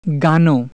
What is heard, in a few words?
Sindhi song